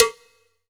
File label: Cowbell B, Pecussion Hits; Zero-G Jungle Warfare 1, 2 & 3; 90 s Sample CDs